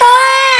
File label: normal hit whistle; osu